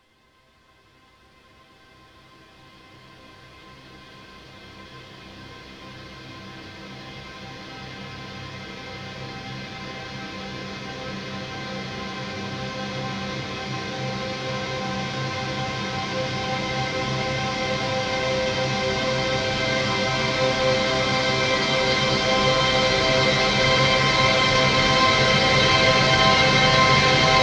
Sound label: Orch Hit 2 16 Bars C, 140 BPM Melody Fills; Vengeance Samples